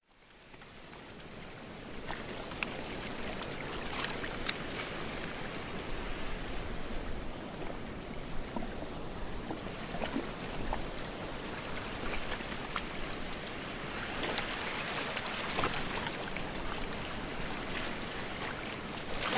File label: light waves distant surf; zapsplat